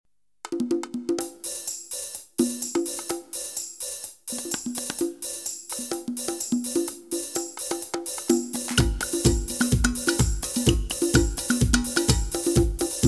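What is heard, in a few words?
F minor live track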